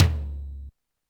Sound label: Yamaha RM 50 Toms